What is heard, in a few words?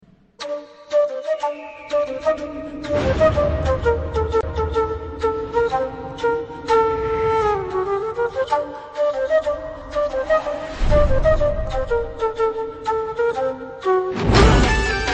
Thank You Flute